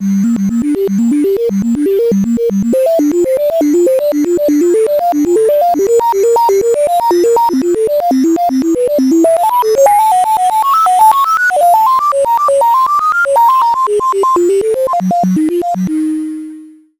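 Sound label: Soulsby Oscitron Continous Sinus Osc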